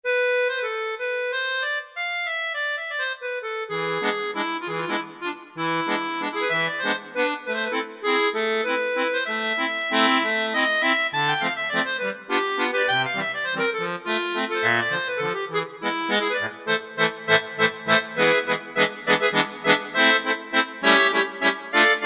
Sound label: Accordion, Korg T3